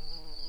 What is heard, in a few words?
bumblebees, Lucorum queens